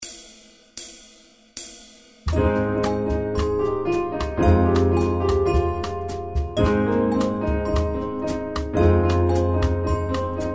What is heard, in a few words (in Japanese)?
gotochi pop; ご当地ポップ; 明るい